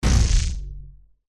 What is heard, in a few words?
sound design impact designed glitchy thud; zapsplat